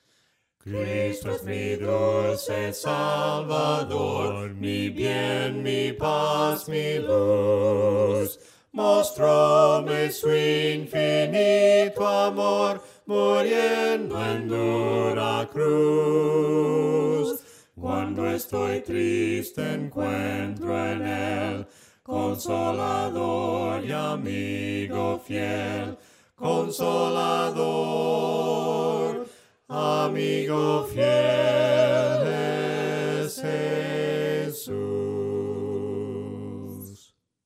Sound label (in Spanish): VOCES; CRISTO ES MI DULCE SALVADOR